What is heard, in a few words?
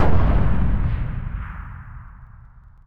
allied sniper distance; WAR